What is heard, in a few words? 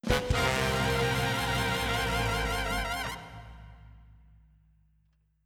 Surprise Sting; Paper Mario Color Splash